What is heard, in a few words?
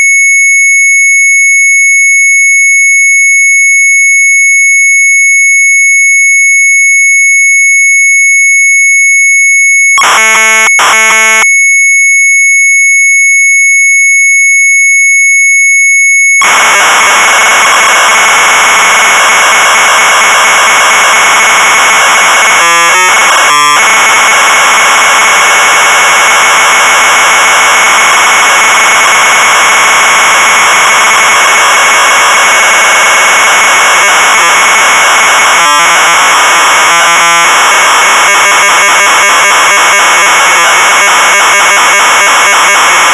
MZ800 Tape, sharp; Making magic music; retromelsarchive